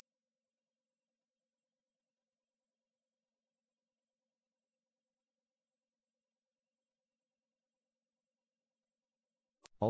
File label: dog whistle